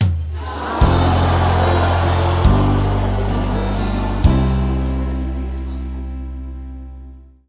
Wrong; Dinox; Wii U

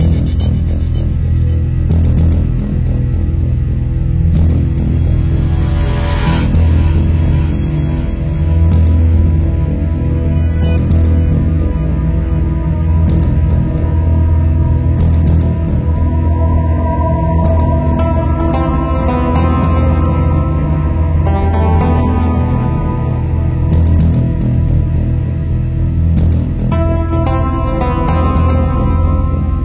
Ambient Loop

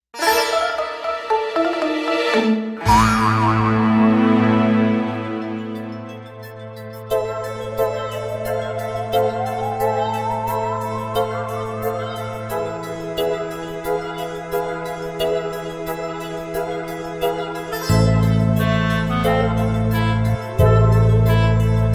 Defeat; Yokai Watch 1

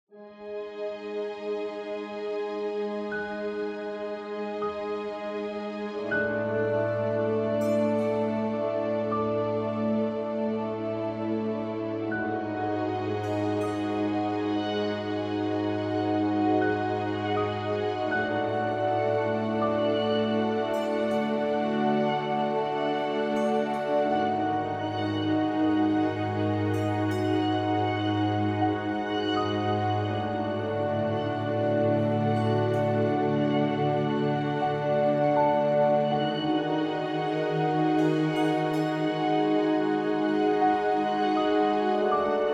Music Universal Calm

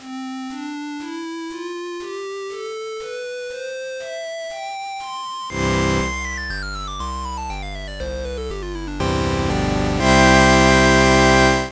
CMS Music Card Tester; creative music system; ibm pc xt at

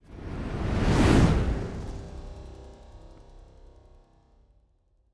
move in windmill

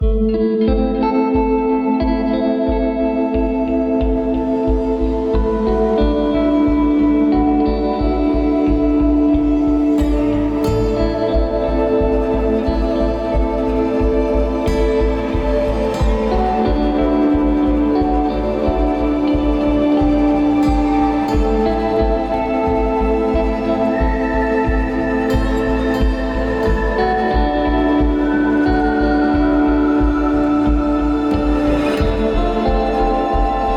Day Ambient Loop; Route99

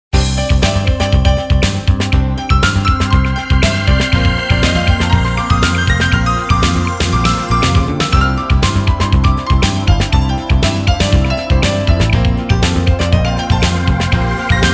Piano Theme; Project Hubbard; PGA Tour Golf 96

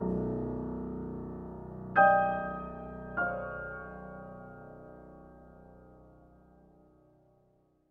Jingle Piano; Rule of Rose

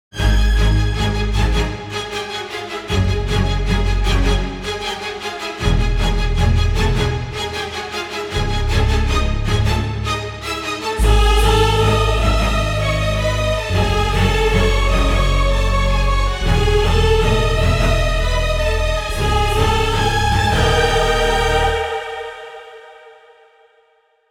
Boss Fight

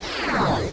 In; Zenonzard

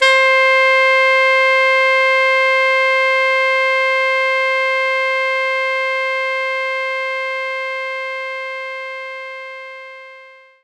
Alto Sax C5

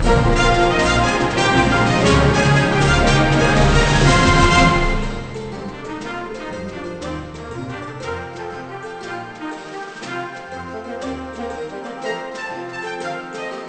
Victory Music Tournament

